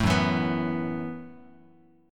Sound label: Gb Minor 11th Gb, Bass Standard, chord; strummed; JGuitar